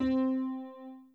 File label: piano short